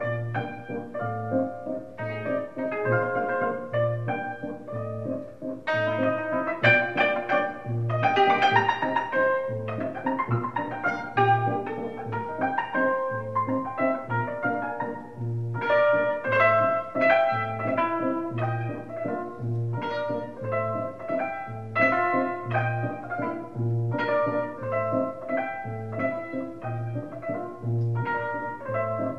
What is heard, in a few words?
waltz, cymbalistes